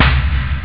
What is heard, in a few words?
electro, drums snare; OctaMED SoundStudio V1